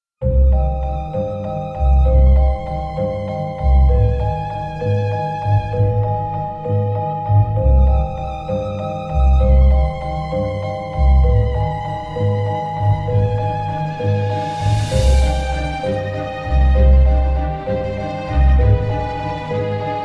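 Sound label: Halloween music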